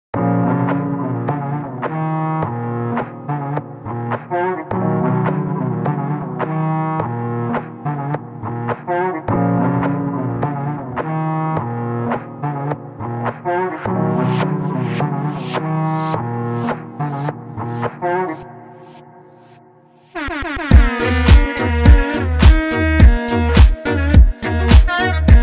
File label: Trap minus